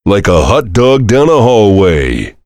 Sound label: heavy laugh long; tf; duke